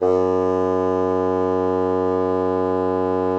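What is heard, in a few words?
bassoon forte F#3; Xsample VOL-6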